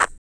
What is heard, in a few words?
RSS PERC